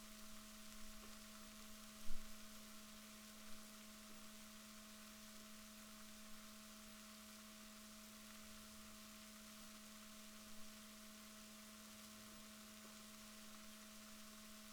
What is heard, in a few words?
rorquals positif, Balaenoptera Physalus Chile